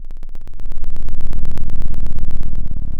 Sound of an engine revving

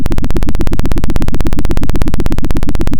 Sound of a helicopter

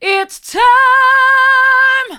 it's time hard, vocal

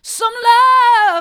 hard, vocal

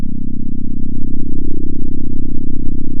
center, sound of a small motorcycle

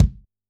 Kick, drum

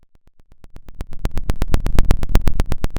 pop, Sound of a fire crackling

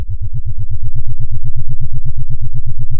Sound of a plane taking off

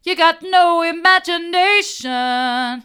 you've got no imagination mid, vocal

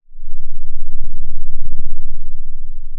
Sound of a thunderstorm, center

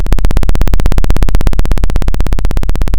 Sound of a plane taking off

